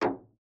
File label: Metal Footfall; soundscrate